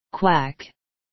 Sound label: quack